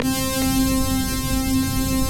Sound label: SAW FUNNELS, TECHNO; Pro-Rec - Synthsonic; Z1 TECHNO